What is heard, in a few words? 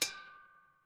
instrument gong